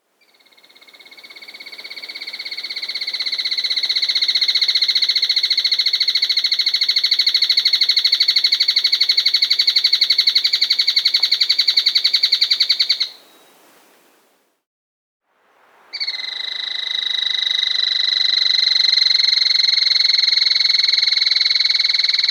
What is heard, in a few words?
Trilling Tapulco (Scytalopus Parvirostris), Andean Birds; S. America